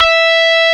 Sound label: E-5 GRUNGE B, GRUNGE BASS; AKAI S-Series CD-ROM Sound Library VOL-7